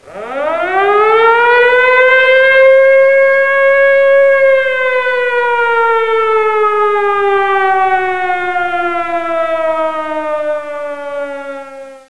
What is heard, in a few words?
SIREN